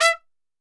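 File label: E3 trumpet shot, Brass Super Section; Best Service; TRP SHOT; 90 s Sample CDs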